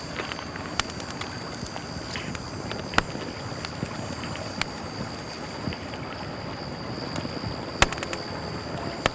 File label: Fire Campfire Small; Electa Quinney